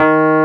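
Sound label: WURLY NO, Wurlitzer electric piano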